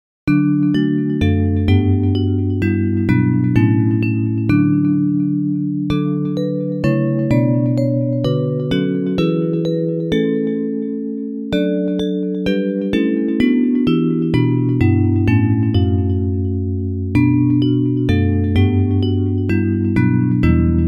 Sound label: Bells; Hymns